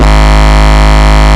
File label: Bass, Root G#; Vengeance Samples